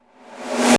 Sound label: SFX REV L; Crypton - DrumBase5000; FX PERCS